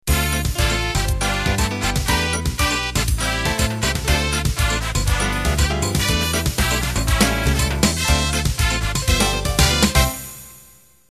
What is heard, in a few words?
Samba Ballroom End